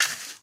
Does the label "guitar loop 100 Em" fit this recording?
No